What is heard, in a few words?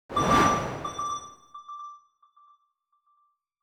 Swoosh Beeps; ActivStyle effects